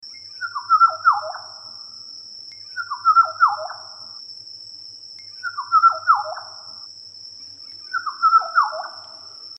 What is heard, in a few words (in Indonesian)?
kepodang coklat